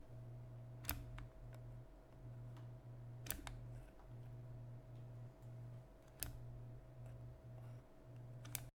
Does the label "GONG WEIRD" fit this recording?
No